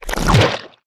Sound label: Mud Fist; The Battle for Wesnoth